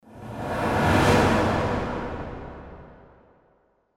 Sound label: WIPE WHOOSH